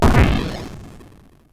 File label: SFX; Narco Police